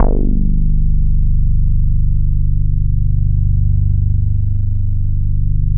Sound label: HAPPY BASS, Bass Analog & Synth; USB Soundscan vol.12